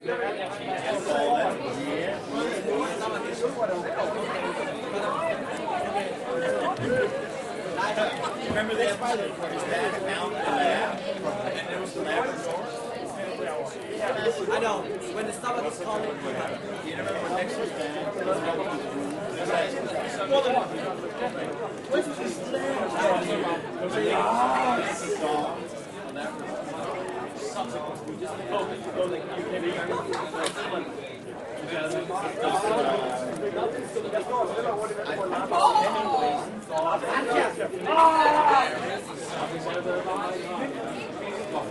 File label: tavern, ambience; mossfoot